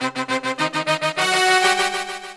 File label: Horn Sect Effect; Sonic Foundry (Sony Creative Software); Jazz Solos and Sections; Effected